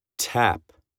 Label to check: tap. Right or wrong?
Right